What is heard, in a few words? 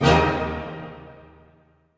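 orchestra; Masterbits - Switch FX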